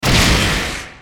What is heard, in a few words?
missile explosion; FoS weapon sounds